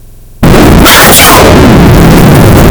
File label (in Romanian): hapciu earrape